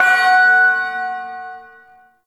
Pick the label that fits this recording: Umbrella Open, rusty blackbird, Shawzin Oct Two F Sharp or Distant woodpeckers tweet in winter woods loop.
Shawzin Oct Two F Sharp